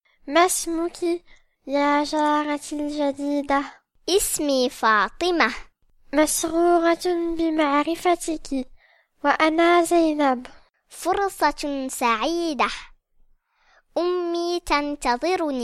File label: rap